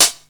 dry high hat sample g key, Royality free pedal hi-hat one shot tuned to the G note